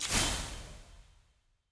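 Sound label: sfx end of game ui gold burst; postgame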